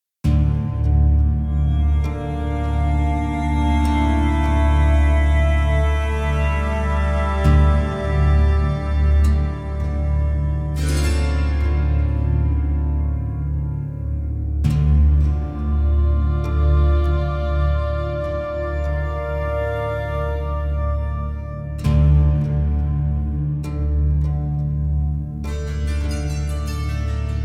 Sewers Exploration; Novigrad

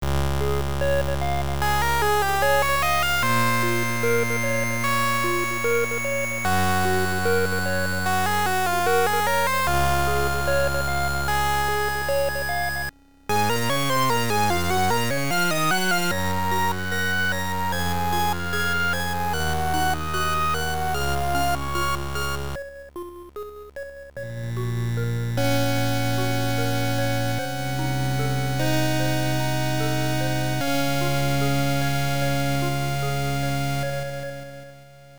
Happy End (All Stages Clear); Legend of Hero Tonma; TurboGrafx-16

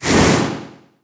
axe

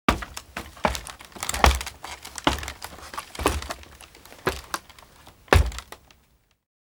Ladder; 1916 der unbekannte krieg gamerip

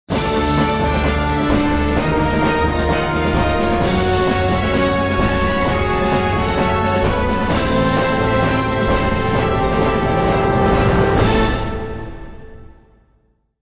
Industrial Combat; Civilization Revolution; Xbox

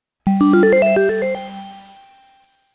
SAVE (Save Sound); Makaimura; Ongaku Taizen

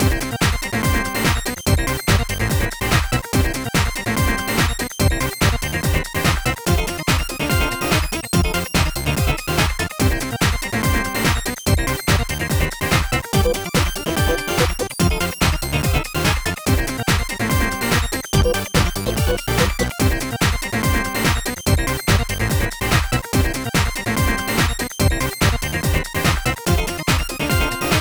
Badge Catchers - Normal (Upbeat)

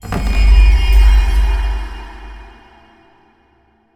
shrine checkpoint

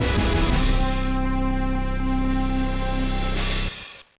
Unknown Jingle; Finding Nemo The Continuing Adventures; 2004 gba

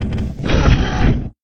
Gate Open; A Robot Named Fight

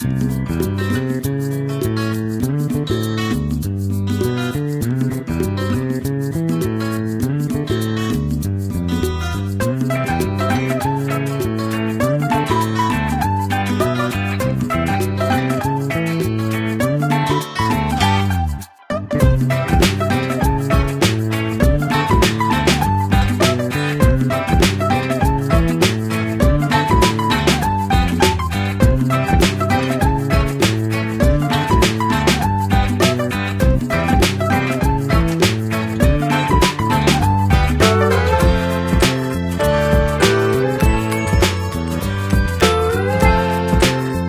Music Main; My Tamagotchi Forever; android; GSFX 2048 Music Main

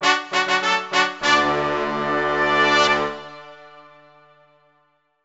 fanfare; Excalibur

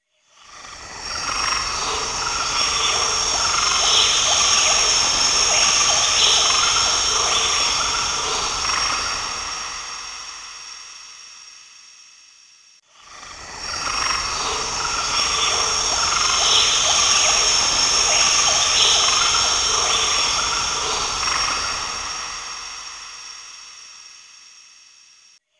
Ambient; Disciples Sacred Lands

